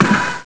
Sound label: CANNON